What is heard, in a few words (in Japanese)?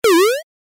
ジャンプ レトロ